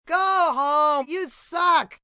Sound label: Boo Go Home, Booing, female